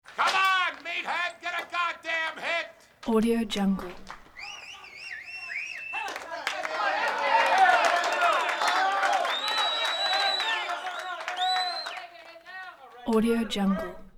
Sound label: baseball team at bench